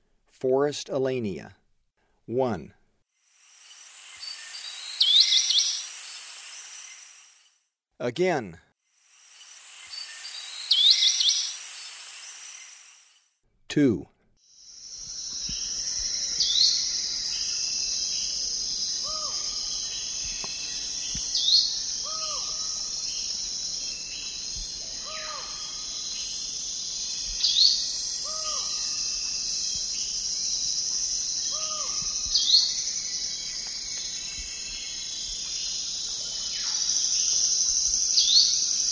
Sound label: Forest Elaenia; S. America; Eastern Lowlands